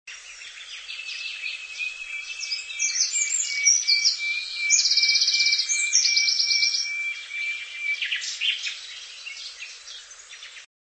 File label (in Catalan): rossinyol